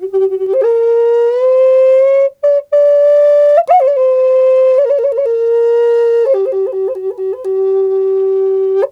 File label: G WILD, FLUTE WILD; Big Fish Audio - Didgeridoo and Other Primitive Instruments